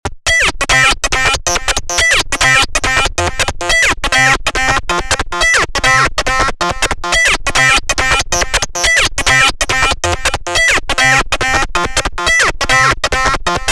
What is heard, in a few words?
Bwah, trance; abx3 trance presets vol3